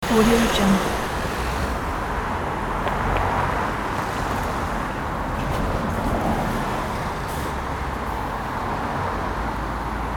ambience vehicles passing very close right to left loop